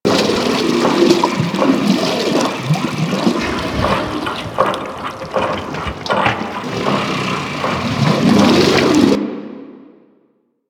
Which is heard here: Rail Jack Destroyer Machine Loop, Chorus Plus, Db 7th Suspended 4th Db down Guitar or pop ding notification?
Rail Jack Destroyer Machine Loop